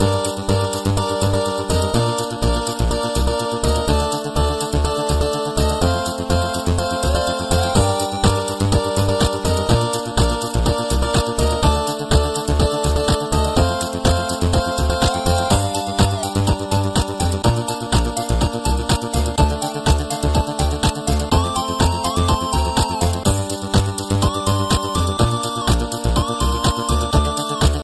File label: 8bit